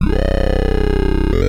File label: SNTHBASS D+B 160 C, Drum Bass, Bass; eJay